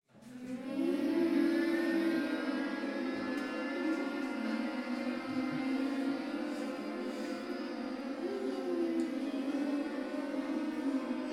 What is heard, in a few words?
mmmmm